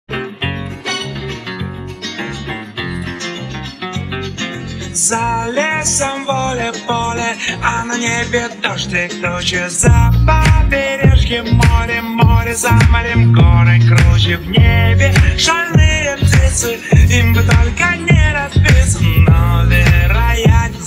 r b remix караоке

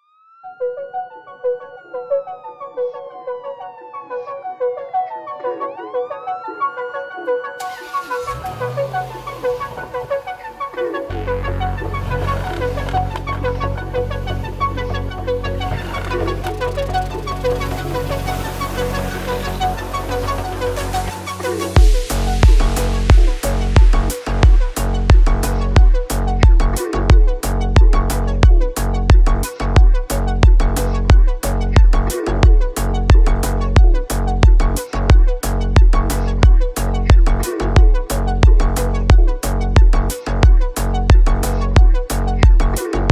усиление басов; Bass Boosted

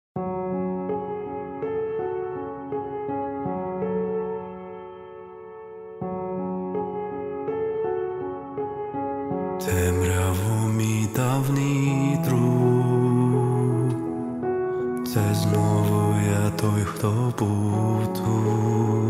кавер на украинском

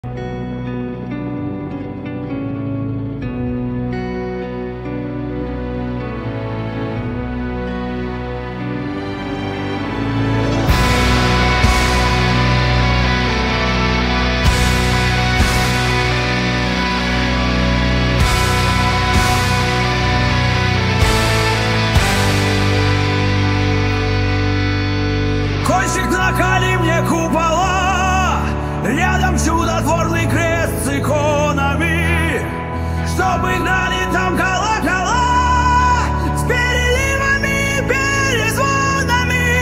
ai cover эпичный шансон metal

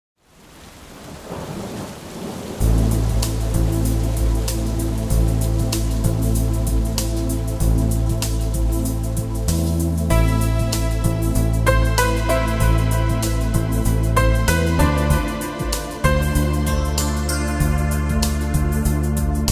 музыка для души

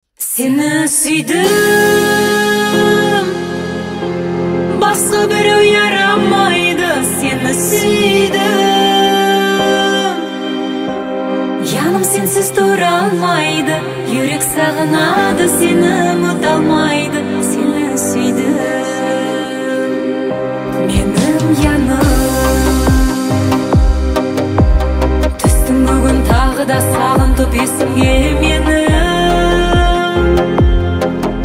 казахская песня на ногайском языке cover

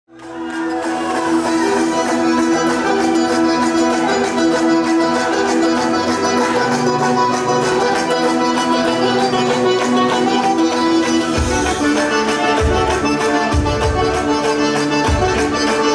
Черкесский адыгский танец лъапэрисэ caucasian dance

